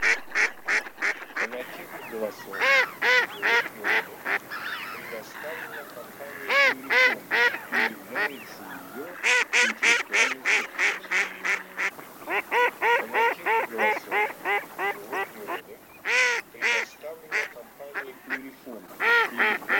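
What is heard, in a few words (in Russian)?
Кряква Germano, манок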